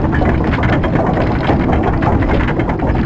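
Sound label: large cog; ShadowMan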